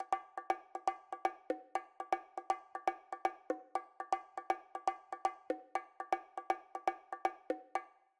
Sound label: Bongo Pattern